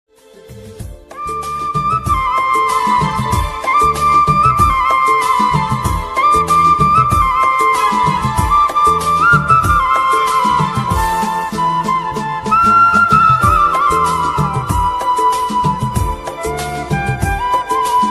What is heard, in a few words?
bansuri ringtone flute ringtone love